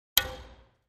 Racquetball Racquet String

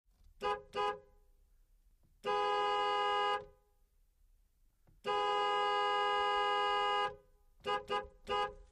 Chevrolet Aveo Car Horn

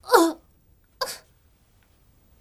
Grunt Female Ooh

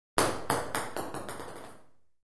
Ping Pong Ball Drop

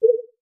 interface button, clicks

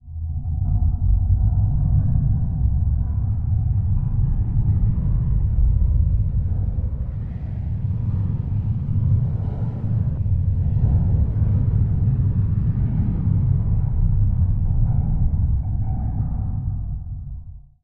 engine revup, spacecraft

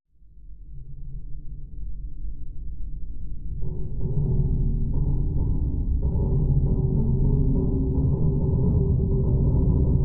Underwater Int Metal, metal groan atmospheres; Underwater Int Metal Rumble

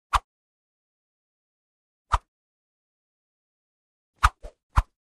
Swish Light 3x

